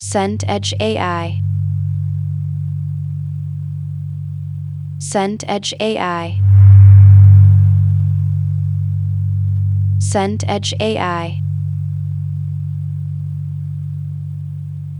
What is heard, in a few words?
room tone hall